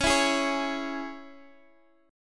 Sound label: Db Minor Db down Guitar DADGAD; strummed